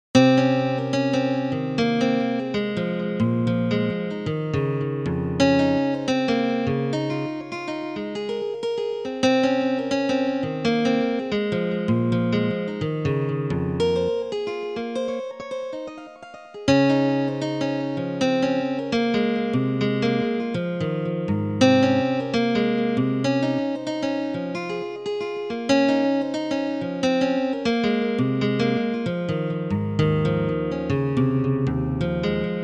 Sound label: restful guitar divisions, fractal music